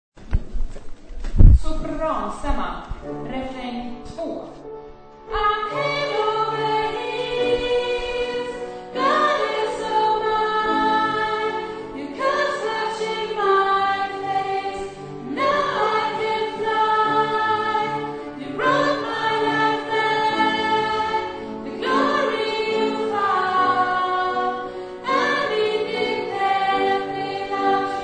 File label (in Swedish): sopran